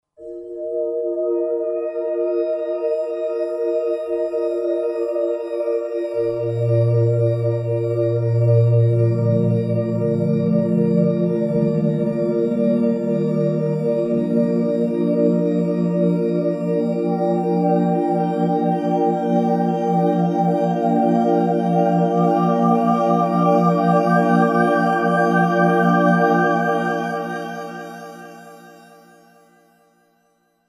Sacred Glass, Roland SR-JV80-14 World Collection Asia